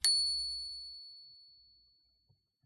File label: typewriter bell; mkh8040; pmsfx